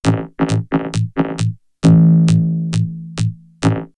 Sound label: Vocoder Loop; backtothe70's; X-Static Goldmine; VocoderLoops